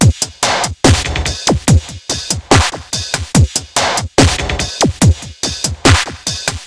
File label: Crunch, Drums Beats